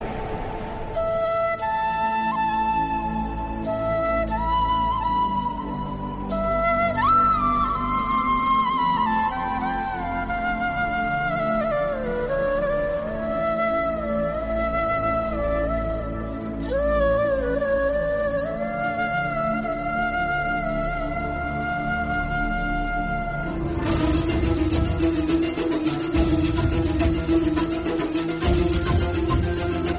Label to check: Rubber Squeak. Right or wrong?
Wrong